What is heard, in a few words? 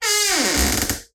Door Creak